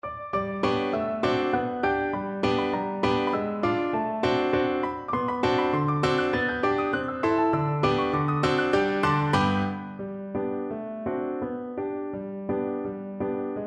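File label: viola karaoke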